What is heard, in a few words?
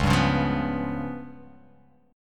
C sharp 7th Flat 5th, chord